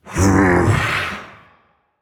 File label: sigh